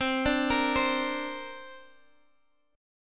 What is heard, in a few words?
C 7th Suspended 2nd, C, down, Guitar, Half Step Down